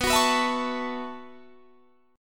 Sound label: B 7th Suspended 2nd Suspended 4th down, chord; B7sus2sus4; strummed